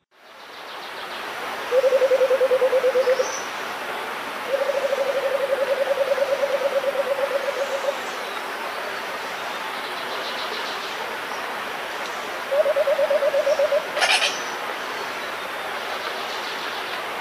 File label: Red headed Barbet